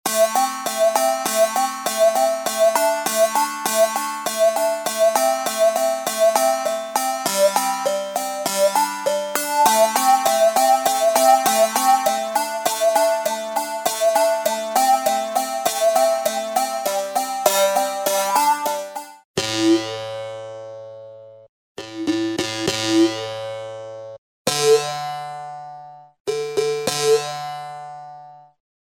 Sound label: Kouxiang, Asian Ethnic Sounds